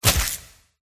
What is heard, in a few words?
attack contact